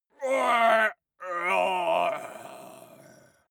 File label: male groan painful double